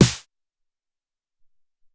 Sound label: 09 Snare, Alesis SR-18